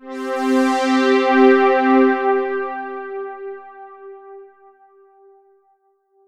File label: Yamaha AN1x String Pad C5